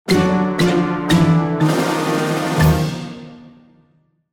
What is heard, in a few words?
Game Over; Run-and-capture; Sound Pack 01.09